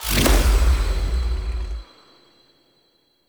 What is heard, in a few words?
Armour Plating Impact; Powers; Bromius